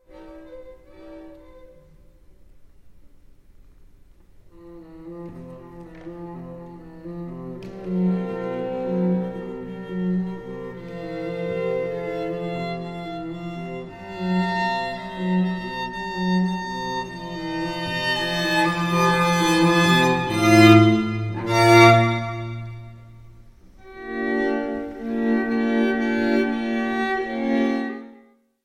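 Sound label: string quartet in C; wolfgang amadeus mozart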